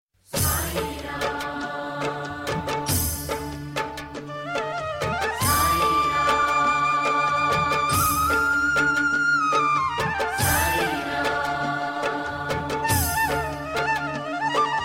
flute ringtone; saibaba

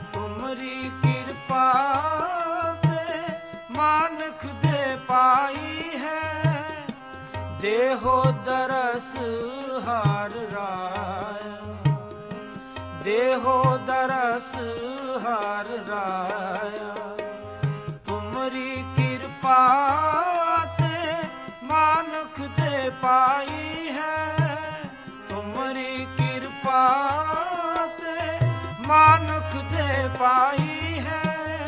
Sikh Ringtone

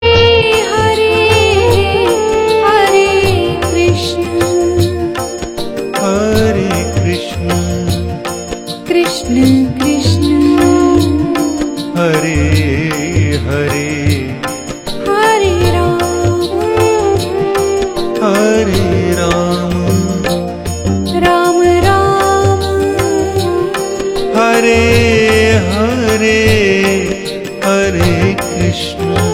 Hare Krishna Chants

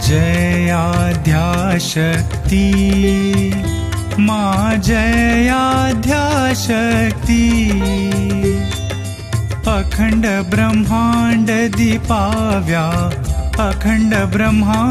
Devotional Durga Aarti, Navratri Special Aarti

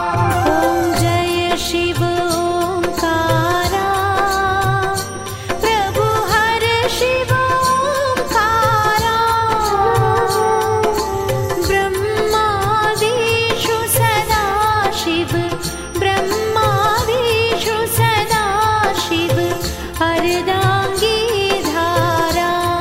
om jai shiv omkara

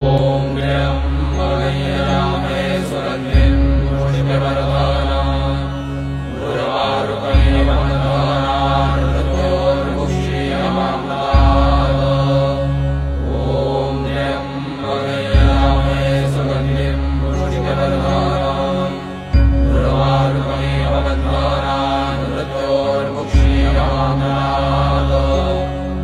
Maha Mritunjaya Mantra 108 Times

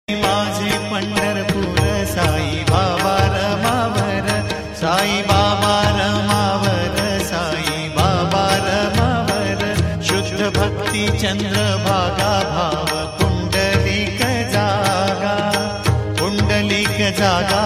sai baba marathi ringtone

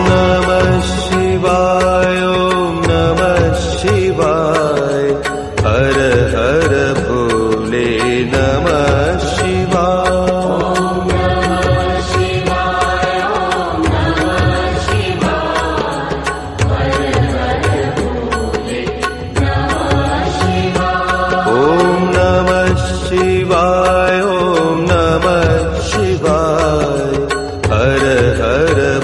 Om Namah Shivay Chanting 108 Times

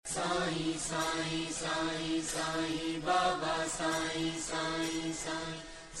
sms, ringtone; saibaba

